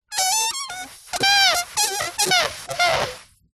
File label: foley balloon air release slow squeak